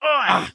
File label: death; Escape from Tarkov